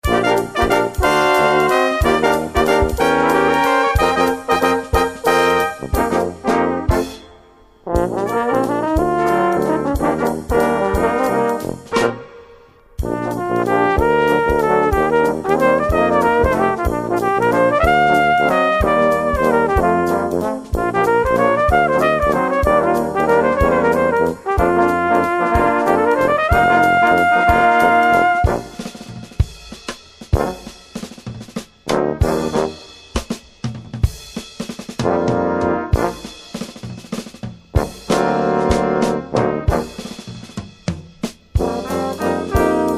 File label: Waltz, Kammermusik